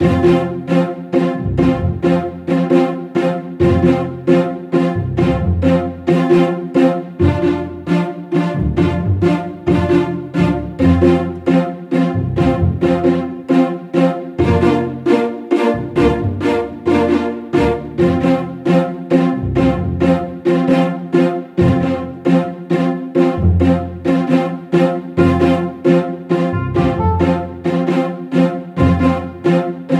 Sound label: Battle Music; Jolly Battle; Android